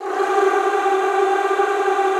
G3, Extended Classical Choir; Best Service

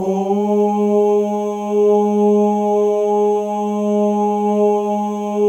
male ohs; Spectrasonics; Symphony of Voices

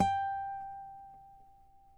NYL G, FLAMENCO NYL

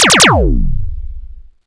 Misc Short FX

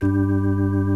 60's Organ

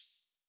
HH, HIHAT

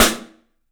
STEEL S, drums; 3D Sound

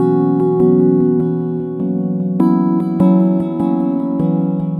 Hi Pluck Echo, Synthetic Pulses

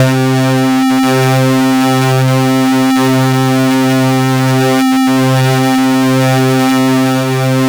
C3, Synth Line; 90 s Sample CDs; NiceBeats; SynthLine VOL-21

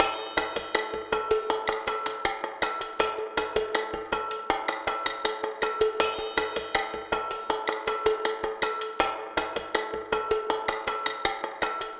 junkyard rhythms, drums

